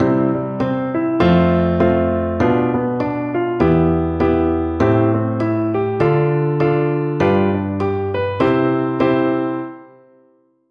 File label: rock, piano